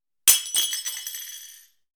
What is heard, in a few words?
Plate Break